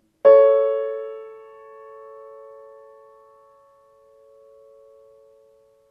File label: Kawai Major Third A C sharp